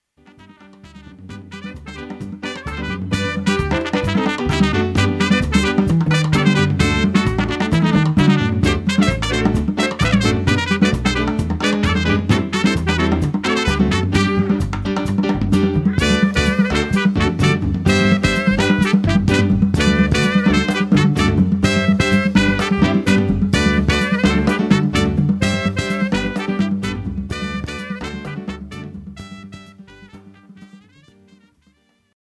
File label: Merengue